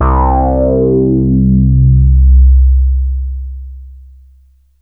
FUNKY, BASSES

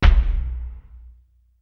DANCE SET, DRUMS

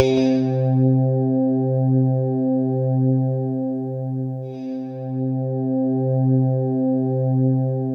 C3, SynthLine; 90 s Sample CDs; NiceBeats; SynthLine VOL-33

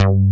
PRO1

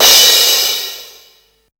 Crash, classic machines dx

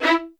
LDVLN1 ATT E, LOUD VIOLINS; Q Up Arts; Denny Jaeger Master Violin Library

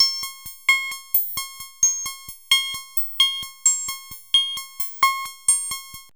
RHYTHM FM

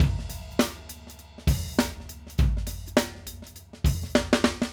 Acoustic Live Drum; Platinum Audiolab

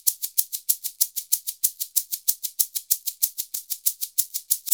SHAKER, Modern Percussion Loops

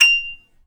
F6 HARP; USB Soundscan vol.62; AKAI

